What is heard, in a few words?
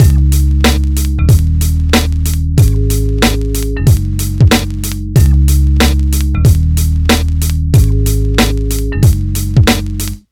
MIX, Hip Hop and R&B Drumloops